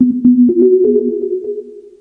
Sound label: synth melody; SYNTH AND ELECTRONIC; MELODIC SAMPLES; hyperion3